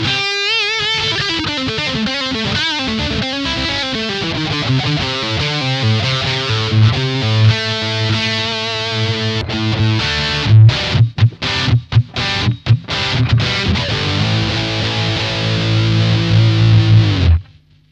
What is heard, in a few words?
bridge 57 plus, guitar, gibson pick